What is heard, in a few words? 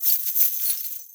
Bird Three Body Shake; Ambience; Warframe; Entrati